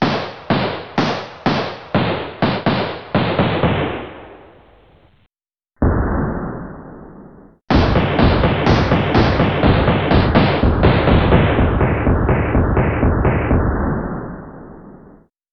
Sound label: gun shot